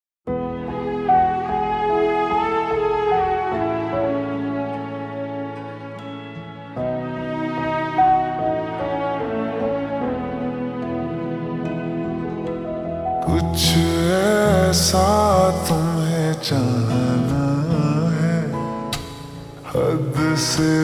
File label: Ringtone Slow Reverb